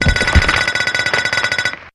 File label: tiles; stone